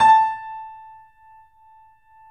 Yamaha C7, 3D Pianos; E-MU Producer Series Vol. 5

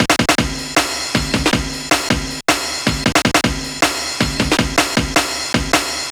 Break, Loops

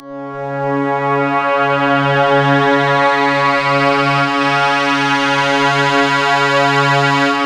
C#, Synclav FM; Big Fish Audio; SPACE2

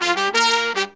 Pop & Funk Brass

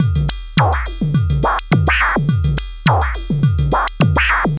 SYSTEM 100; AMG - Vince Clarke Lucky Bastard

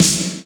Snare, Percussion Hits, Snares; Zero-G Jungle Warfare 1, 2 & 3; 90 s Sample CDs

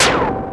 Percussive, Drums Perc One Shots

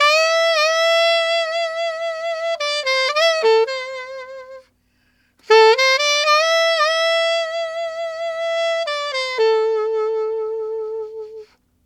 Alto Ballad in A min, Sax; Sonic Foundry (Sony Creative Software)

